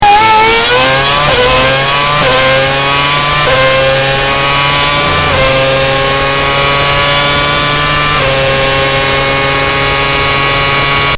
Acceleration, phonetones; Red Bull MOBILE

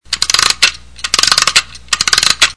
Clockwork; Coolpad S60

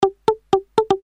Message, notifications

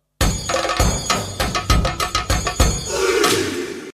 MSG Tone; Itel; it1350

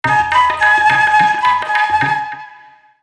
Tribal message; Nokia; Audio themes

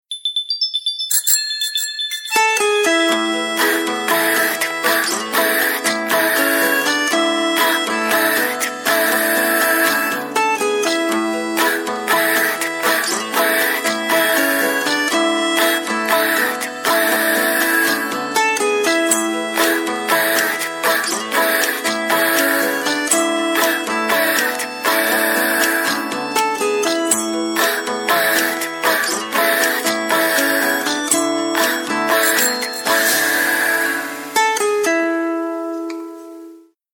Bicycle; LG; KU9600-Crystal-Prototype

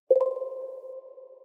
Notif, notifications; Yota Yotaphone 2

